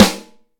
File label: bassy acoustic snare sound g sharp key; snare drum samples